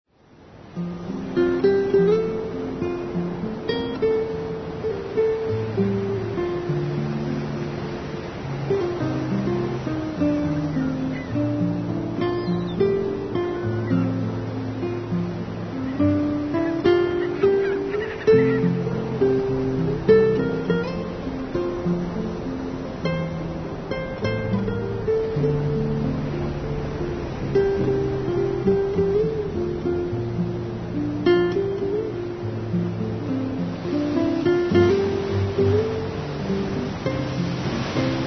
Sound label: Gulls Flight, Pacific Surf